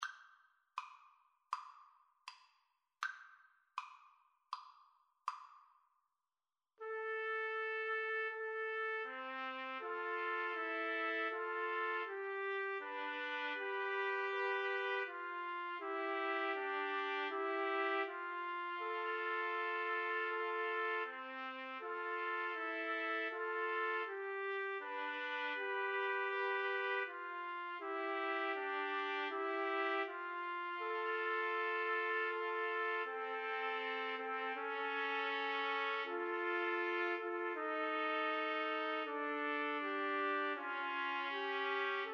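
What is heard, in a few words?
trumpet, karaoke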